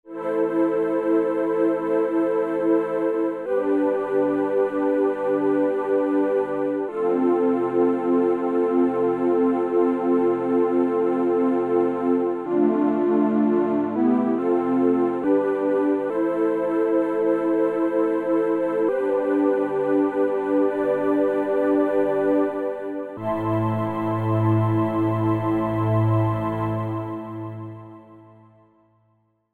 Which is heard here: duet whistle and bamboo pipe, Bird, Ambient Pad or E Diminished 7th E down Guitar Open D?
Ambient Pad